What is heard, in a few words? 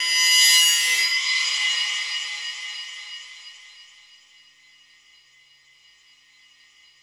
OIL CAN; Distored Reality